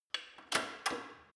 gym bicycle saddle adjust lock soft, fitnessstudio